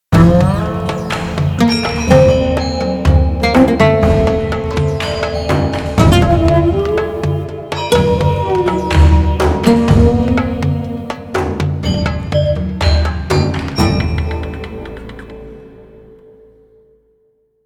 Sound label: Gamelan; issei yabuuchi